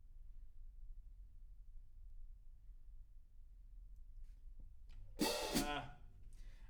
Room A

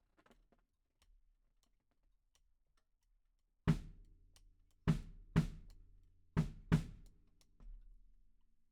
Ride

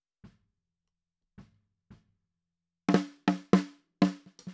Snare Top

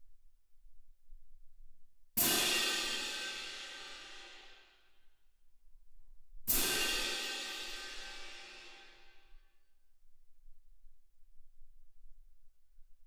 Twin R Perc

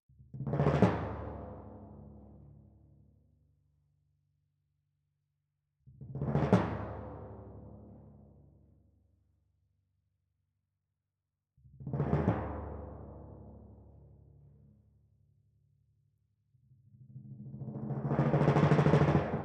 Timp Close

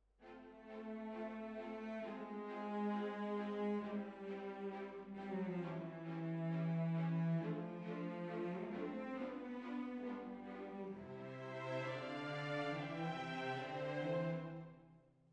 Hall

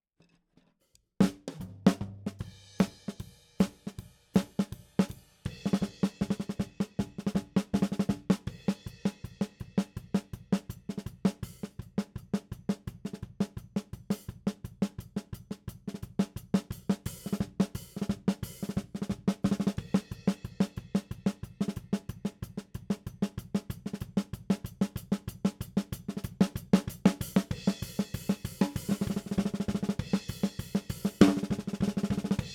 SNR top

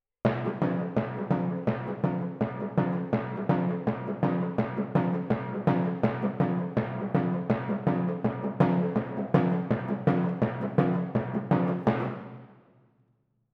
Twin F Timp

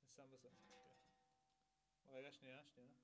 SNR

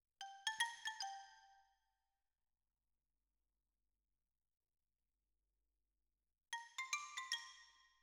Xylo

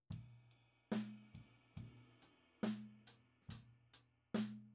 Tom

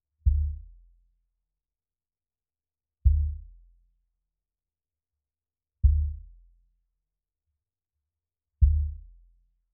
Sub

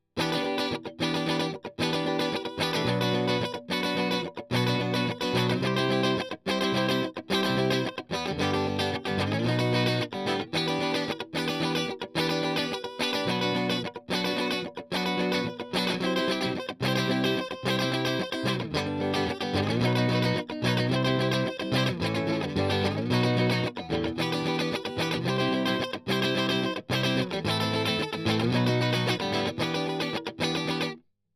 Strum

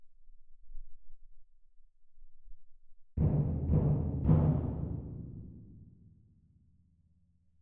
Perc

